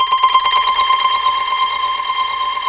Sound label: KOTO C4